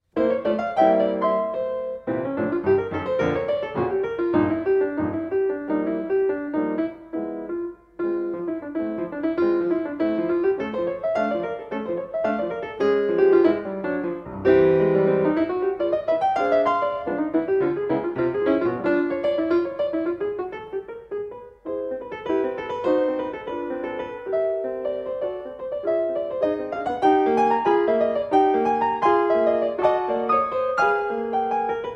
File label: Piano Imagery